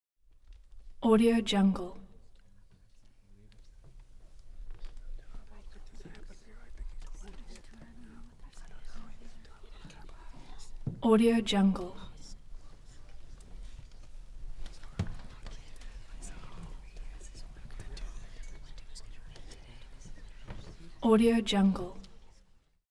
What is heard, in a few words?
small crowd presence